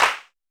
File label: Clap, Alesis SR-18